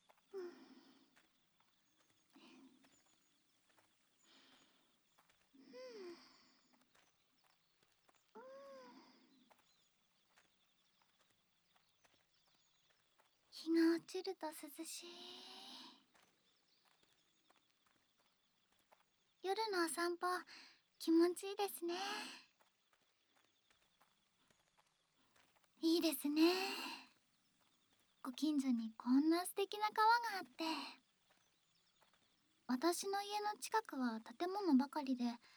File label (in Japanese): 夜の川辺で水音リラックス, ASMR